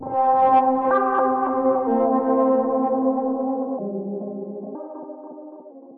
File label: LEAD (C); Variances; Cymatics; Mystery Pack Vol 4